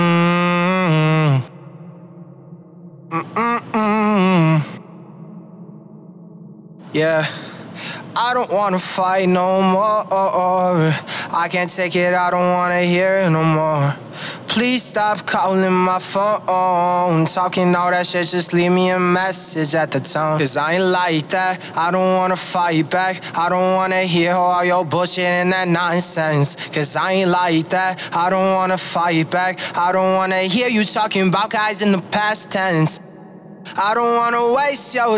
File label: HH Trap 150 Dm